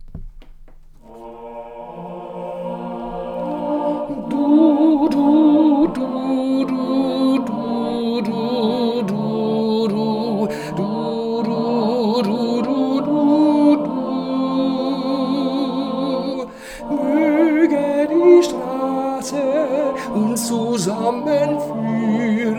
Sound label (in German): Irische Segenswünsche Tenor